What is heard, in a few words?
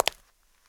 marble step; Golden Crayon Sounds